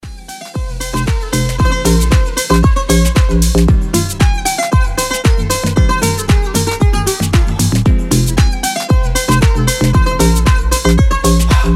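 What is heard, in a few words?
super melody for phone, warm on the strings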